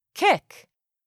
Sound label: KICK